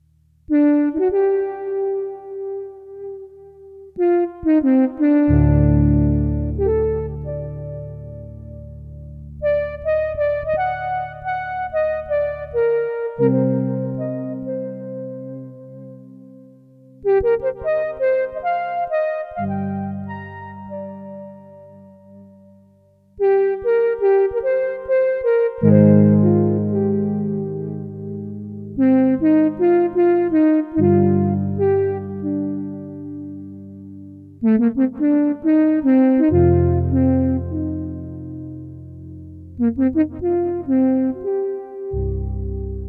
SUPER6 Solo

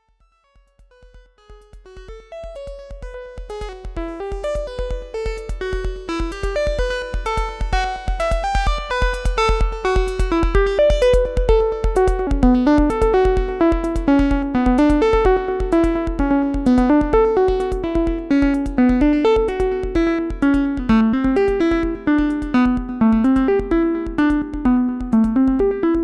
Model15 High Sequ, synthesizer

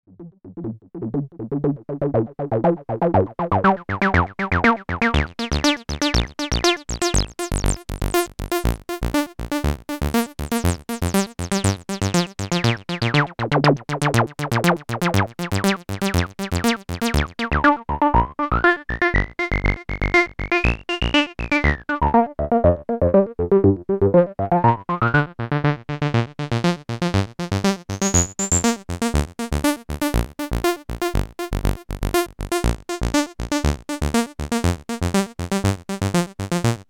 Volca Bass

